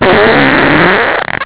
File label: fart